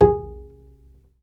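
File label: STR Cb Pizzicato, strings; Roland L-CD702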